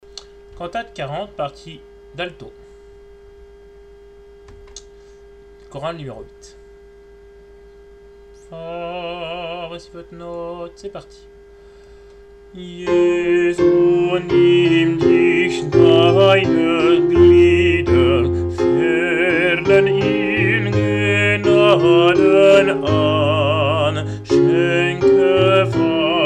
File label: coro alt; bwv 40